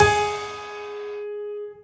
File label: normal hit whistle; osu!